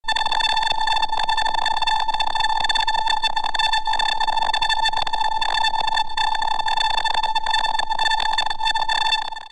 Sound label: noise